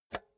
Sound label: insert xlr multi socket hard; objekte; elektronik